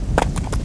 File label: Keenkirin Run; Seer; GamigoNA